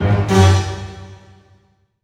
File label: Orchestral Loops